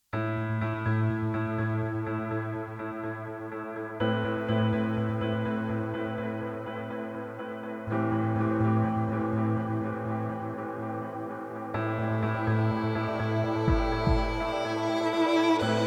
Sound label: Atmospherica 124BPM; Production Music Examples